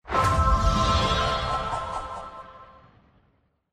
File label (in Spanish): obtener logro